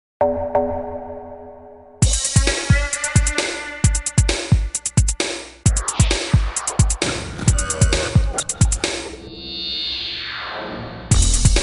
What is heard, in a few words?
Electro